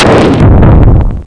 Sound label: LIGHTNIN